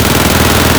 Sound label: glock18 indoor distant loop; Escape from Tarkov